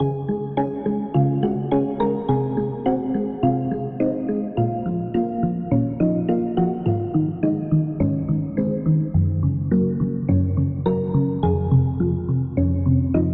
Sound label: Key; Routed Sound; Spire Expansion - Story Walker Vol.1